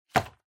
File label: Magazine Put Down